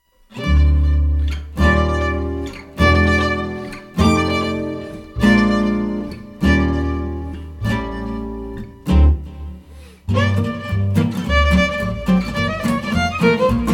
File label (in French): Douce Ambiance